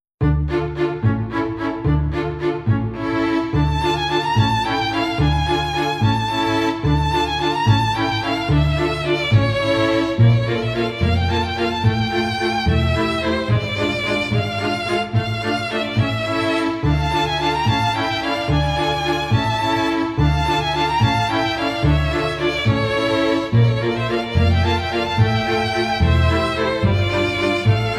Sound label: Suspicious dance hall, bgm